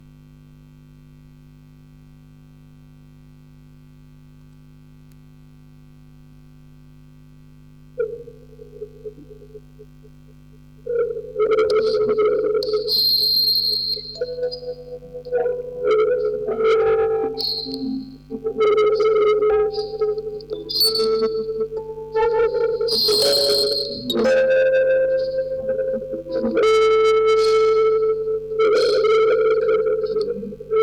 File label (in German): Aufnahme Vocoder doppelmono cut, Spieluhren-Quartett mit Tonband; Tonband, Vocoder und Fieldrecording